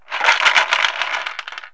shaker; HOUSE; FUN

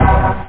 hammond stab, organ; Mecomp Multimedia 1

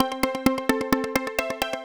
FM Perc C, 130 BPM; The Electronic Garden

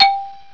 bang; Mundo do CD-ROM 17; 3Dland

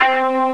Lead 3 (C3); X-Wing; X-Wing Patches

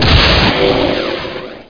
attack; Hexen II; medusa

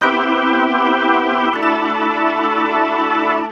Synt; SMOOTH 04 B

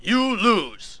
lose; fight

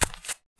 foot; PC Gamer IT CD 45; SMANDEMO; baddys

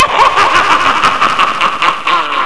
scary laugh; SpookHouse

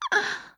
groan

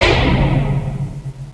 Teleport; incudemo

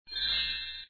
key Sound; save princess minnie

